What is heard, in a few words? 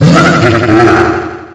attack; freak fortress 2; dry bowser